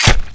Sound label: attack; riki